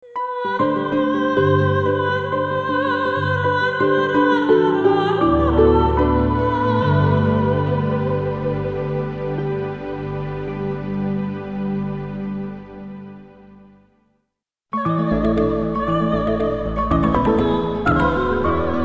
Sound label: gentle jingle